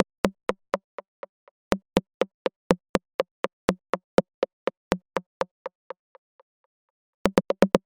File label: 122 BPM Rims; percussion essentials 1